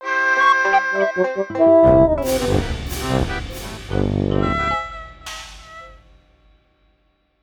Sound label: Beats; Sparklite